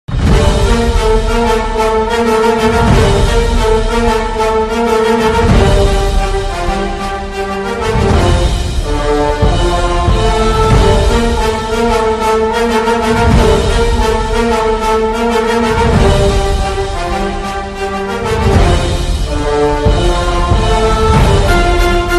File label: mass bgm ringtone, tamil bgm; ponniyin selvan